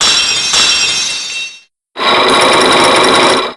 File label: lock end